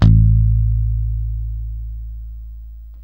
ROCK, PICK BASS

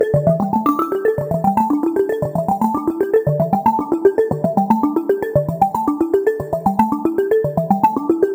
115 D Seq Bell Arp; Sequences; Zero-G; Future Wave

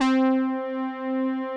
saw brass C4; Greytsounds; Scott Peers Sound Engineering

